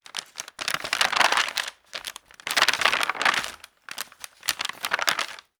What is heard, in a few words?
stiff paper book paging; mixkit